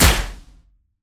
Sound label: Gun Shot; SoundsCrate